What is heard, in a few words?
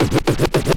SCRATCHES